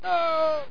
M Fall; landslor

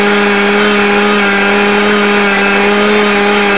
engine; s1kdemo

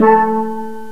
steel drums

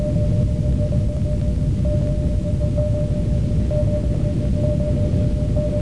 bkgrnd

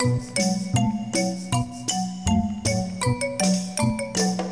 Credit Loop; Le manoir hanté

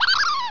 GIGGLE; Death from Above

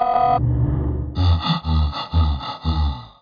Gorilla; PC Gamer IT CD 41; machines demo

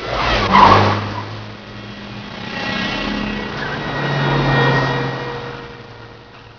drive

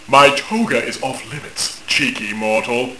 tickle; apollo; Reversi • The Eclipse

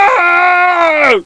bell; animwave

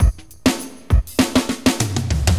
Drums, Acid Jazz Funk; LiveAct Pool

